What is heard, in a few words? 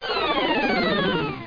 Home Background Materializes; Let's Discover Michigan!